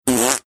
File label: making fart sound